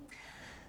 Birds

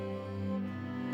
Music Only

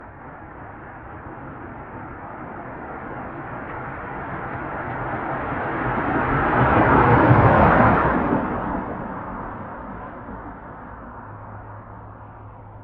Generic Car, Pass By, Fast, Desert, Ext; Poetry Project

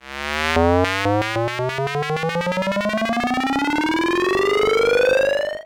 Retro Video Game FX; The Look Back SFX

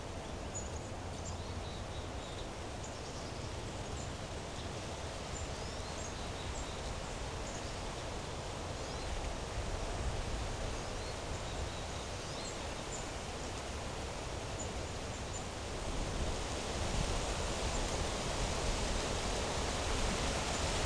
Wind In Trees Gentle Gusts; Poetry Project

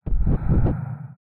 Swipes

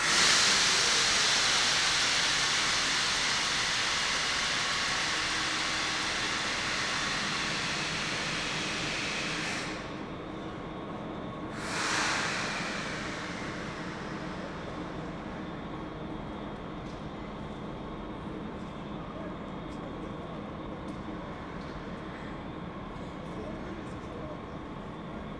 Train, Station, Light, Air Blast; Poetry Project

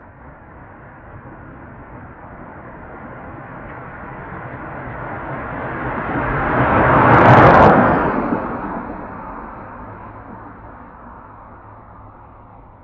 Generic Car, Pass By, Fast, Desert, Ext; Poetry Project

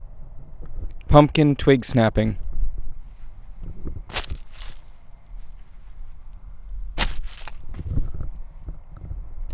Pumpkin Stem Break, PUMPKIN HARVEST FOLEY

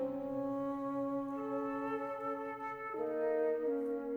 Music Only

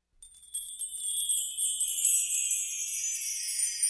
Wind Chimes Orchestral; Electa Quinney

